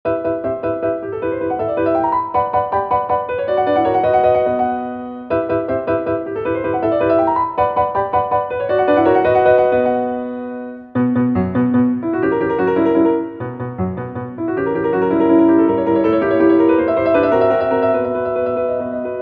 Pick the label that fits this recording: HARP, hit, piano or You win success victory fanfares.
piano